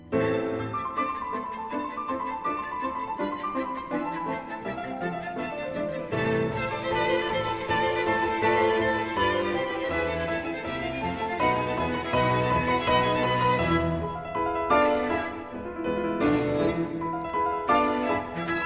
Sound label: Quintet in A major for Piano Two Violins Viola and Cello Allegro ma n; Allegro ma non tanto; Dvorak; B. 155 Op. 81